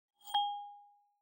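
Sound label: On Off Passcode Unlock Companion Haptic; i OS; iOS10; nano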